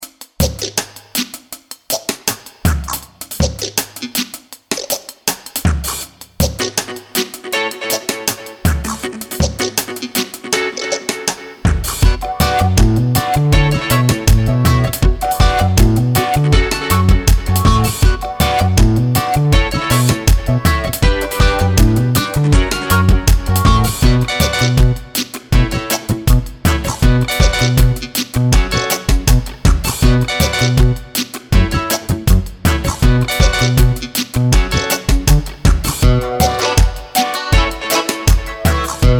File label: tropical jam, dub